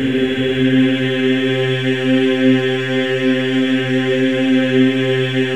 C4, Symphony of Voices men; MEN EES OCT

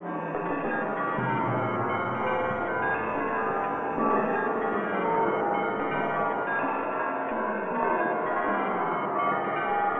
Chaos Bells, cinematic drama soundbeds; musicradar

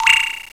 SPROING; FAIRLIGHT CMI IIX; SFX

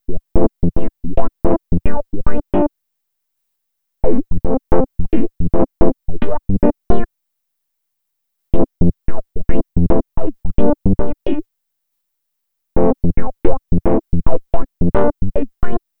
Dirty Piano, Electro Lounge; Dirty Piano Man; Sonic Foundry (Sony Creative Software); 110 Strictly Legit

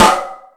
House Machine, Drum Machines

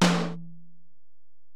Tom, Alesis DM-PRO, Drum Machines, Toms

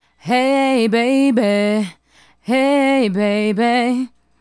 Female Solo, Hip-Hop and RnB Vocals

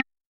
Perc Wood High, Vermona Mono15 Drums

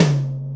HI TOM, Ensoniq ZR-76, Drum Machines; 300 Drum Machines

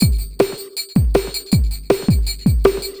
Beat, Futurist Drum & Bass; Drums & Percussion

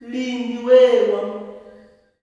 "LINDY-LUM", MALE; Spectrasonics; Heart of Africa 2; S.MALEAMB

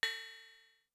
Cym; Sonic-Potions LXR; SeaWaked

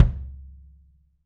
KIDM, 3D Drums, MAL KICK

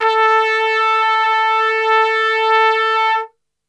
A, Brass; Best Service; Brass Super Section; TRP STRGHT

